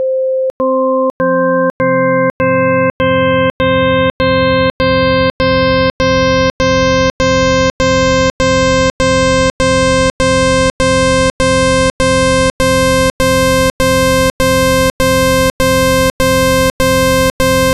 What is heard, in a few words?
30C harmonies, synth